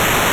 ship

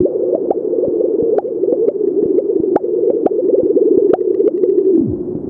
Analog Flow

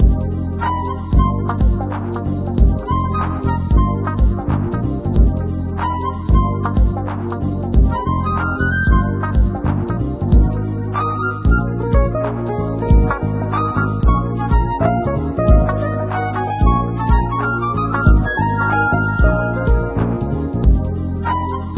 Interior Shop

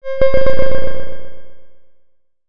Engine

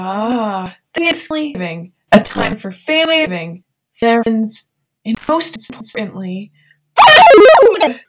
ahhh

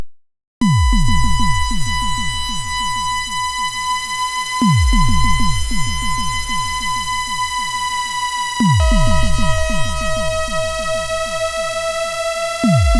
Eerie

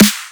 Snare

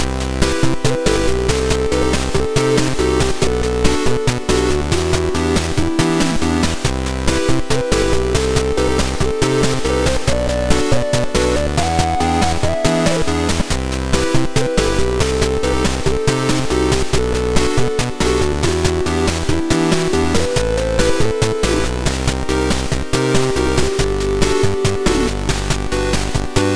bossa beat

